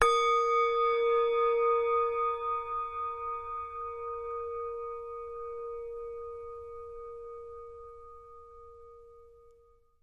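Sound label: Bowl Medium Strike, singing bowls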